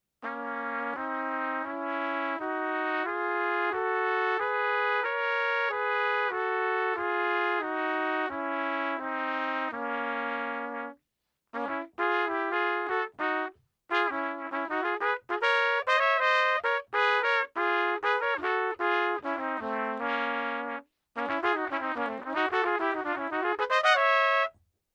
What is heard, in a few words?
Pitch Shifter Minor 3rd Interval; Bb Minor scale with Pitch Shifter set to Minor 3rd Interval - Trumpet; Pitch Manipulation Pedals